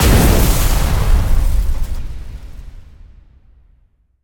Fire

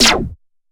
Cancel

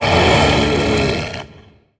Monster

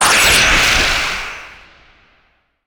Wind

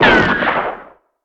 Gun

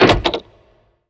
Open

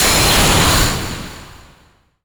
Battle

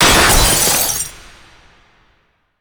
Ice

